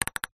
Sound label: sport table tennis ball fast short bounces on table